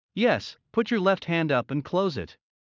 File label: Heart; Classroom humanbody